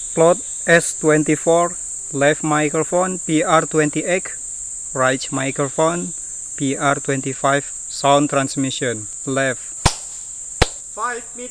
birds; ecoSound-web

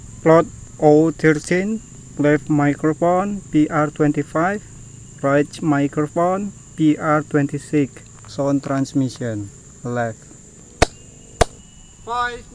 birds

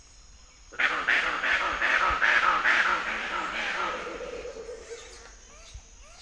siberu, Presbytis siberu

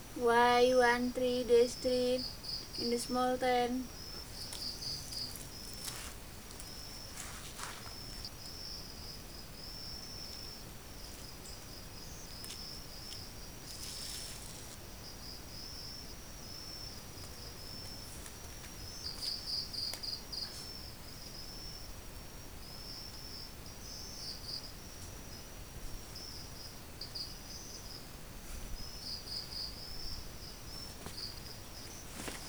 smalltent, Hypsugo macrotis

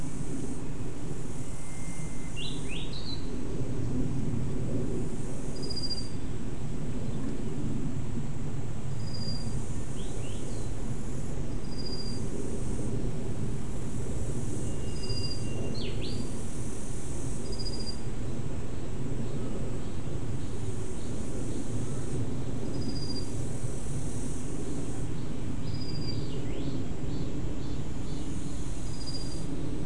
mixture of bird and insect

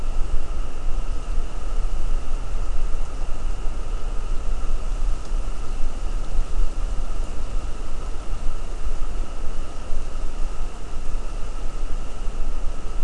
mainly insect cluster

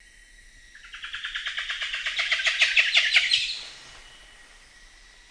Presbytis mitrata south

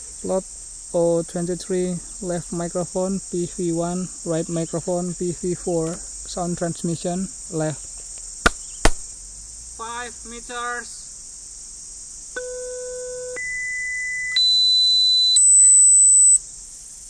birds

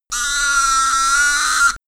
Croak

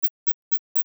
Cheirogaleus medius Whistle

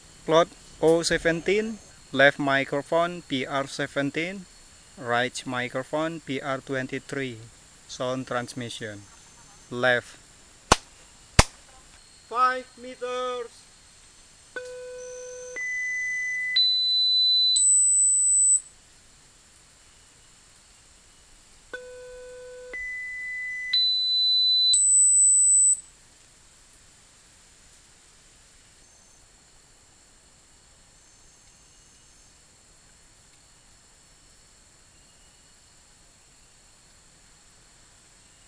birds

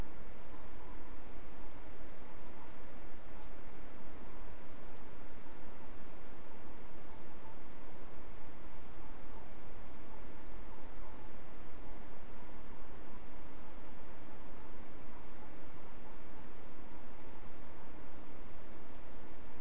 no obvious biophony

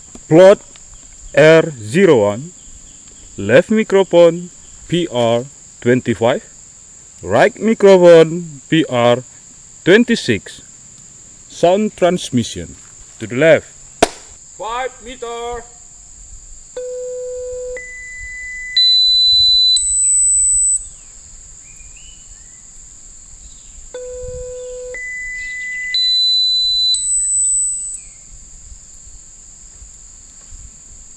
birds

